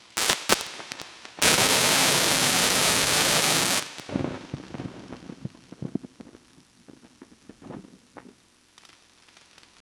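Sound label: high voltage lightning spark, chamber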